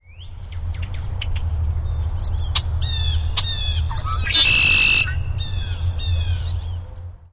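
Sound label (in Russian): красивое пение с диким воплем который издает черный дрозд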